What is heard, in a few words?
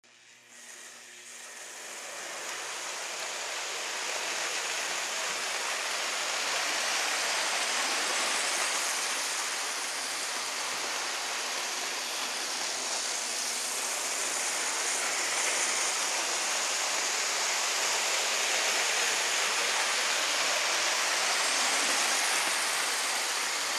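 toy steam train and track sound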